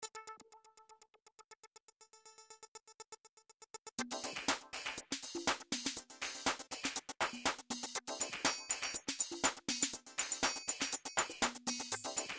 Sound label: korg triton